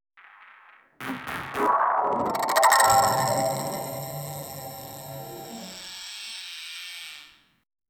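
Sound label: charging up a energy ball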